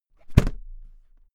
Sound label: briefcase close hard; industrie wirtschaft; bueroarbeit